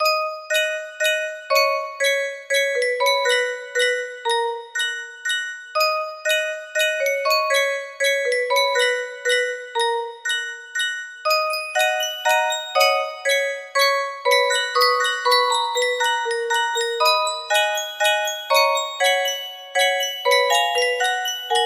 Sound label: waltz, music box melody